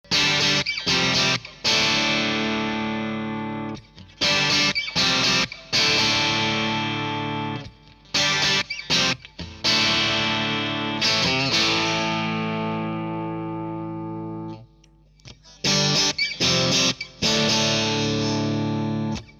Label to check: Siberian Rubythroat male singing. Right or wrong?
Wrong